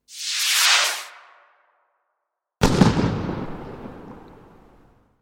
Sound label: Whoosh sound of a rocket firework followed with a bang; Fireworks; Backgrounds